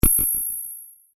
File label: UI Back, User interface feedback sounds; Ui Set A; Computers; Electronics